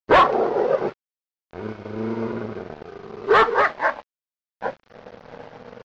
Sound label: The dog growling